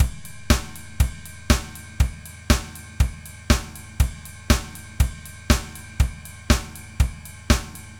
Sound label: Basic 4 Ride Drums; Drumloops; Dr. Fink's Funk Factory